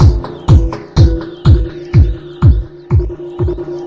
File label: 123 bpm hard fours chordums